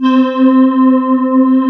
CMI ORGAN

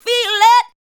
ADLIBS 90; Ueberschall; Jam Box

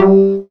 ping; Sonic Foundry (Sony Creative Software); Synchro Funk; One Shot Sonics

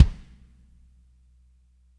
WRM KIK; kick; Spectrasonics; Burning Grooves

